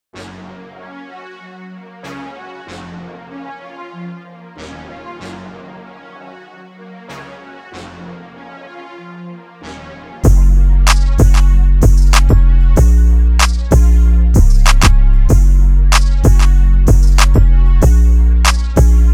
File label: beat